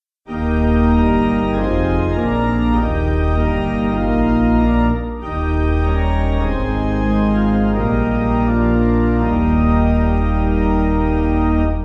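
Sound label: pipe organ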